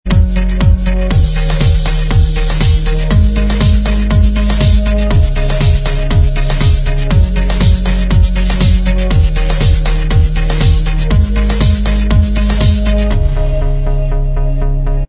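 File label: dance remix, instrumental track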